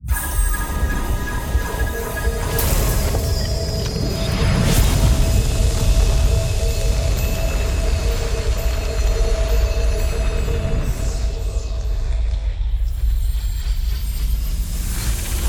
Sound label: defeat; B Path of the Teal Lotus; shogun